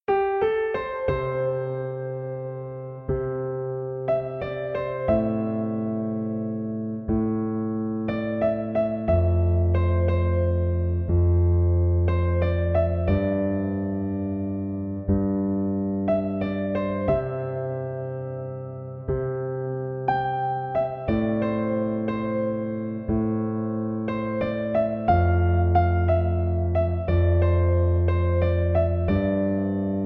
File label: Easy Piano